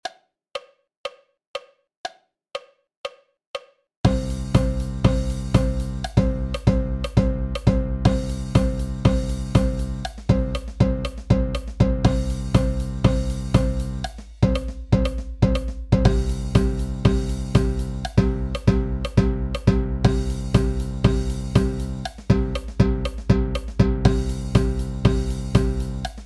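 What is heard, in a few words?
120 BPM with countoff, Marching Percussion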